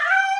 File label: desert wolf damage